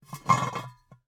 Cookie Jar, ceramic, glazed, irregular shape, hollow base, hollow top, rough, resonant, tonal, jostle; medium; Eiravaein Works; Vaeyan IV